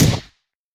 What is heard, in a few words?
meat hit; HeavenStudioPlus; meatGrinder